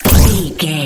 sci-fi weapon blaster laser boom heavy

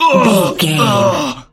voice male death

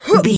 voice female effort jump action

